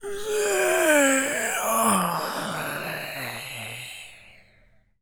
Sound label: Male Taunt, Zombies; zps; Male1